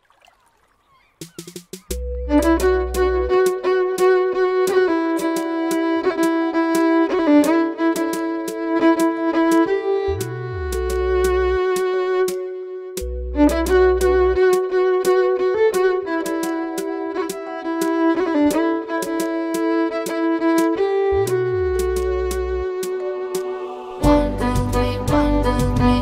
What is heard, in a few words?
violin cover